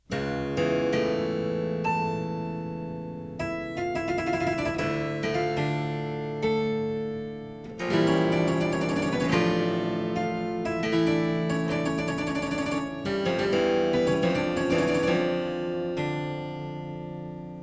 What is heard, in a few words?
Suite in F Major - Adagio, Harpsichord; Suite No. 2; HWV 427; Handel; Suites for Harpsichord